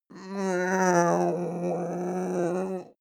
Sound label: male grumble cranky; menschen; koerpergeraeusche; soundpool